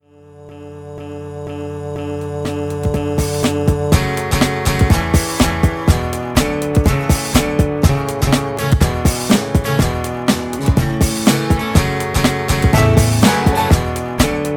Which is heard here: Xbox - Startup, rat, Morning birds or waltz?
waltz